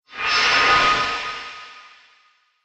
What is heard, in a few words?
Trailer Accent; Trailer Sound Effects; sound design